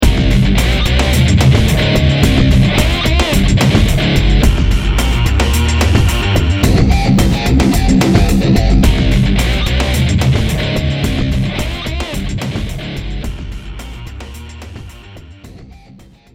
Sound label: rock